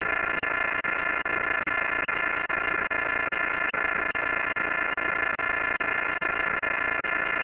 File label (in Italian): pipipipi, beeps